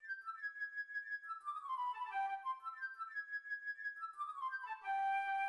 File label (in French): L'oiseau la flute; Pierre et le Loup